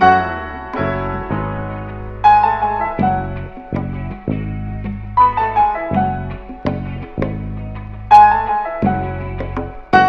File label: piano, Phonky loops